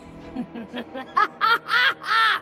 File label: hahahe